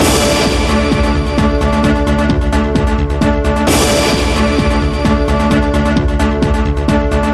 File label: News Loop; Primetime; ringtones